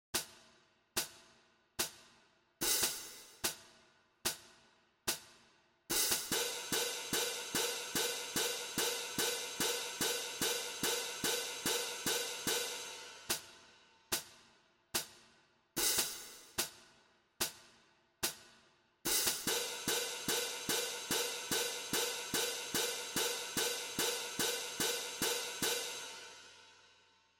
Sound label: jig cymbals